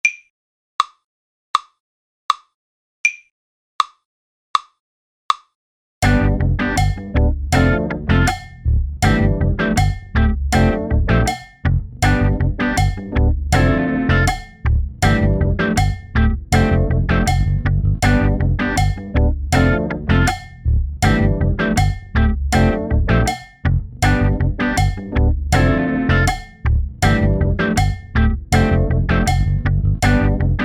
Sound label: Kit Solo; C